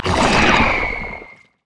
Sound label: elastic slingshot; Zac